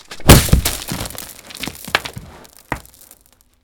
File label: Hit With Dirt Spray Body